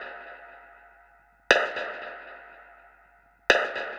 Drum Kit Echo Snare 120, dub drums samples, 120bpm; musicradar